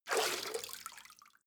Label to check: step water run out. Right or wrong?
Right